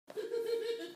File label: Woodpecker Laugh